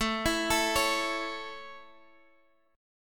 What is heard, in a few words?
Db Minor Sharp 5th A